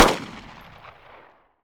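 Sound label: glock exterior shot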